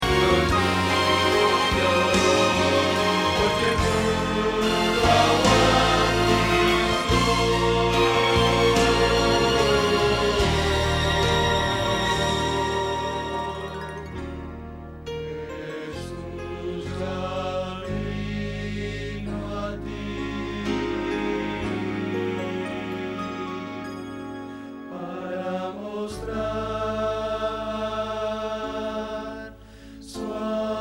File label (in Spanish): Bajo